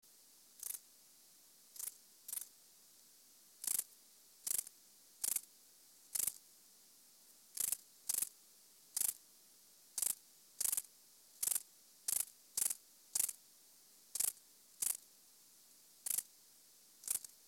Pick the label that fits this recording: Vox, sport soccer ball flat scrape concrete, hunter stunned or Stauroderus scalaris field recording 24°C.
Stauroderus scalaris field recording 24°C